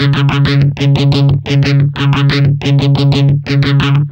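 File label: GUI L1 C#, guitar; Best Service Gigapack 2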